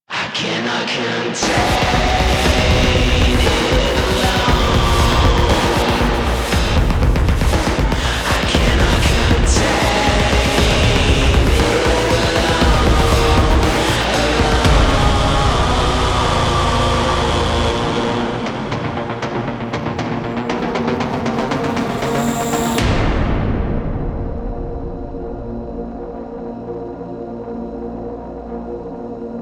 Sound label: Tunnels Outro Cue; Warframe; Dynamic Music; Cues